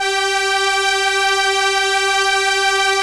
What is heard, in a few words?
STR ARP, ARP Strings, ARP Slow Str; Roland LCDP09 Keys of the 60s and 70s 1